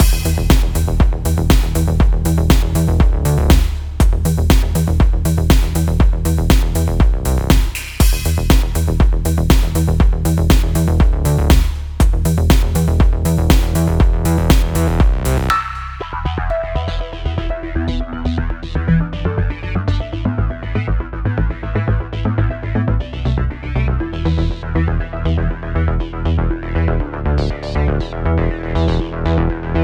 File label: music knife-action; Necrobarista Final Pour